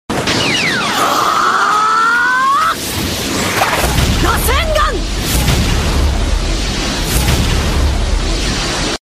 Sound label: rasengan sound effect; naruto